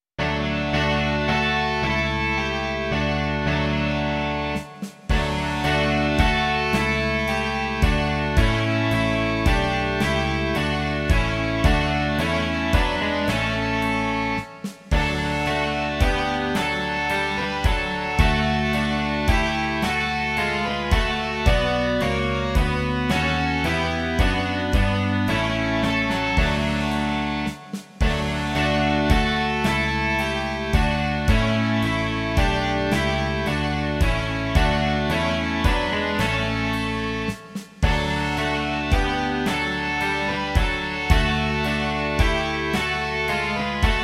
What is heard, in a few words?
rock